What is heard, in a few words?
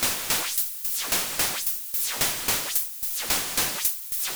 MiniBrute 110BPM, Gated Seq Noise